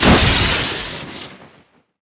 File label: rocket shoot crit; tf2classic